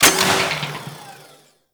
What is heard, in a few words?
Fire Mech, Weapons; Warframe; Gorgon